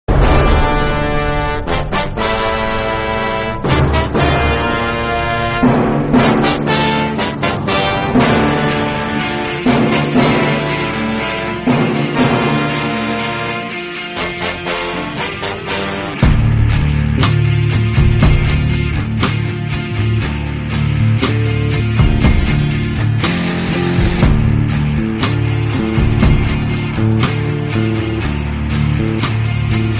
movie bgm; mahaan

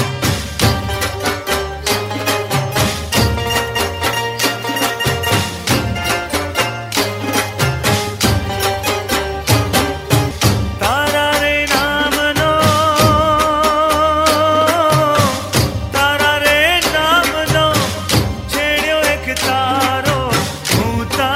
dandiya song ringtone

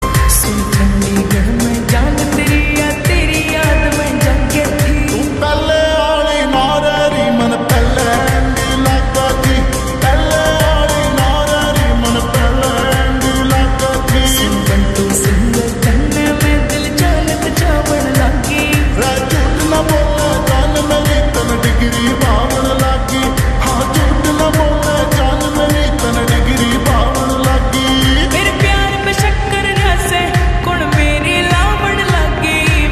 lofi ringtone

